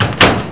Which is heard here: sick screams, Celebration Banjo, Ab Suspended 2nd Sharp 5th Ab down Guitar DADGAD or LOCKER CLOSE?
LOCKER CLOSE